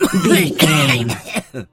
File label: voice male cough long